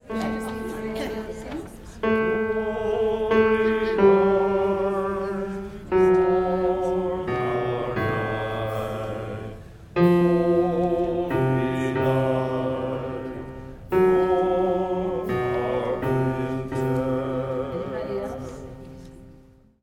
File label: bass